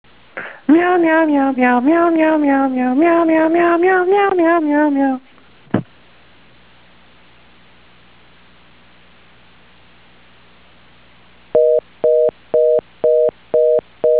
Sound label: meow